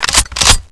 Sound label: shotgun pump